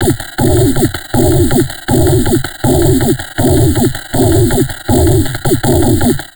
160, Drum Loops Crazy Processed

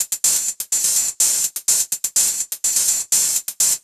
drum loop transit hat 125, Classic Chicago House; Sample Magic; Drum Loops

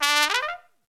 SOLO TRUMPET

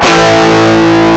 fuzz guitar; CMI Sound Library Rev 1.3; GUITARS

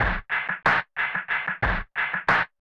Band Filter Loop, Drum Loops Distorted; Zero-G Smoke

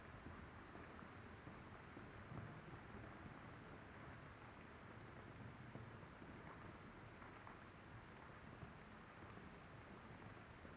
Rain Ambience; ambient or nature

